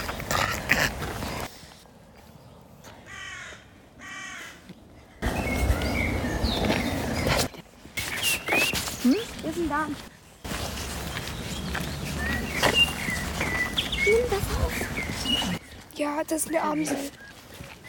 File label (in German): rabenkraehe amsel; vogelkunde